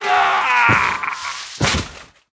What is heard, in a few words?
dark warrior dead, monster